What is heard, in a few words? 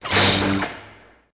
lightsaber wall; weapons